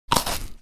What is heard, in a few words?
Crunch